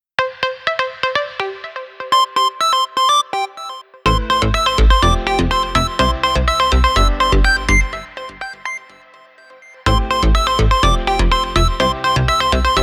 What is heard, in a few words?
Stereo Lenovo Gaming, ringtones; ZUI 13